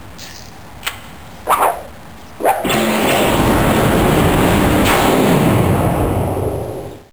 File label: Fire Weapons; Saban